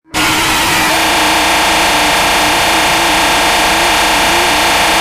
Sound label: jumpscare; trtf 4 golden frankburt